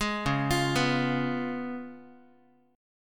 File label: C sharp 7th C sharp, Guitar; C#7; arpeggiated; tuning=4A,4E,5A,5D,5G#,6C#; guitar chord